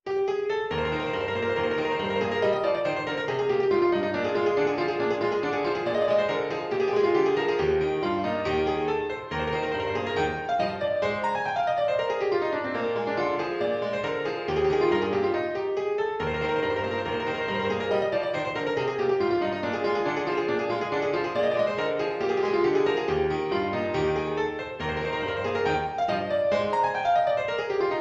Hora Staccato

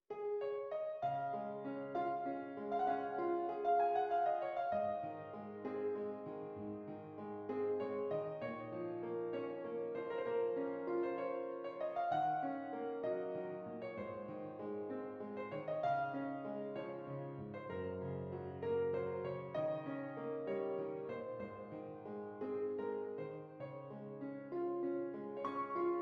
Prelude in D Flat Major; Autumn Prelude No. 2; Autumn Preludes

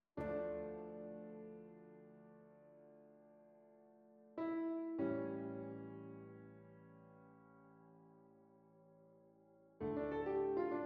Piano Solo; Arranged by Genti Guxholli; Little Sheet Music

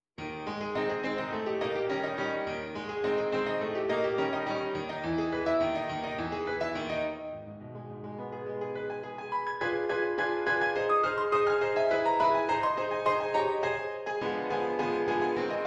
Maple Leaf Rag

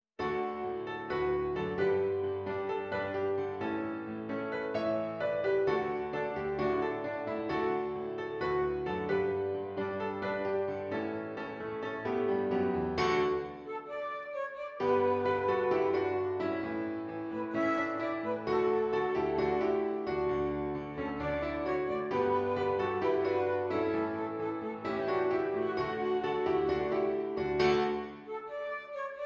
Flute and Piano

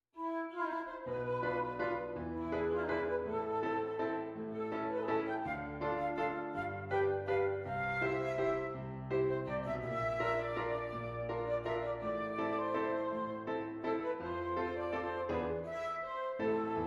Flute and Piano; The Legend of Zelda Breath of the Wild

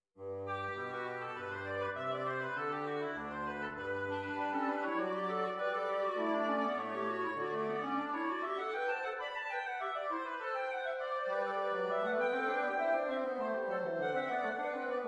Sinfonia No. 10 in G Major; BWV 796

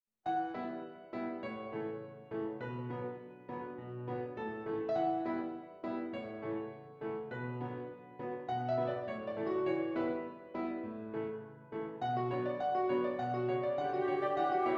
flute solo

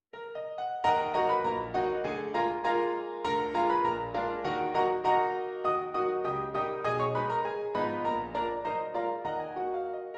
Italian Polka

